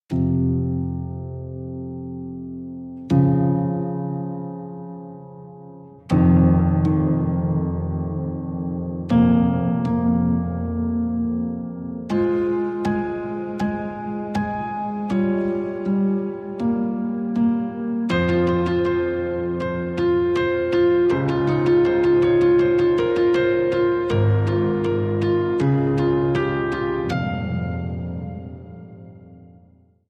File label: Murderous Limbo, Piano Only